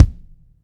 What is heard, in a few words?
BD, Bassdrums, Roland TD-20